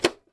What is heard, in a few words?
tape click